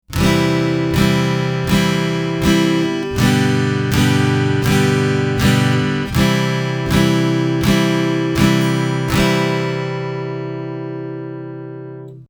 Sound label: easy strum, acoustic guitar